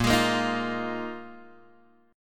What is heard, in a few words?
A sharp 7th Suspended 4th A sharp, Guitar Open G